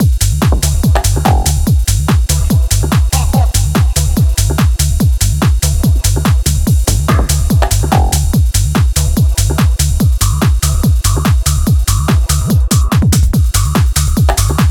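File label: 144BPM G maj, groove techno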